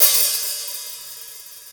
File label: GOTA HATS; AMG Gota Yashiki Groove Activator